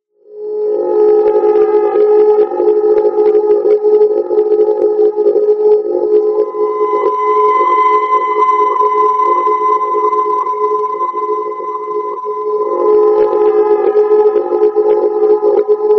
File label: freaky electronic ambiance